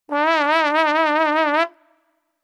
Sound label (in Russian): rasterjannost truba